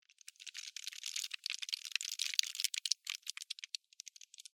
Insect Walk Move, Animal, Insect, Walk, Movement, Bug; Insect Movement Sound Effects